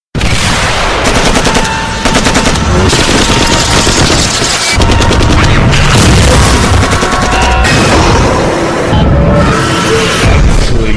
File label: Bullets Firing